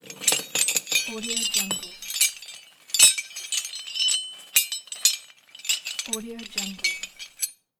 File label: metal chain pick up and rattle; industrial sounds